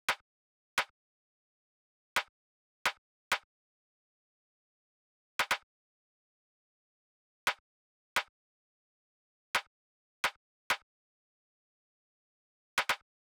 perc

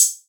Hat, Closed Hats

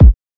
KICK

